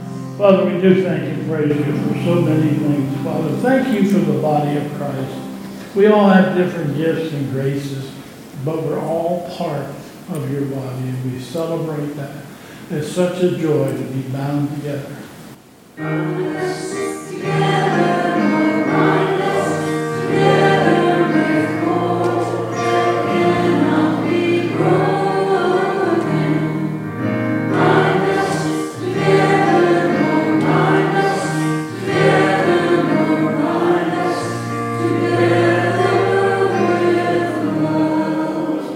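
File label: Closing Words and Chorus, church service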